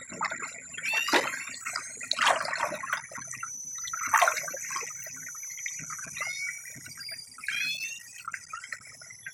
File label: Chirp constant